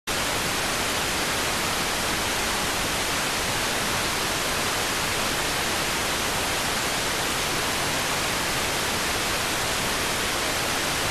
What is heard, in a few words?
rtty rx